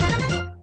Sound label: extra ball lit; sfx